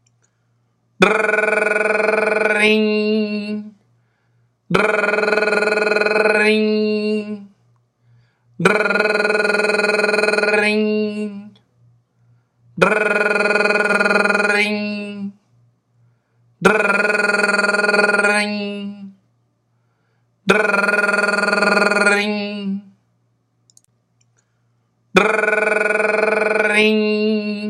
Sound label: Drrrrrrrring; meloboom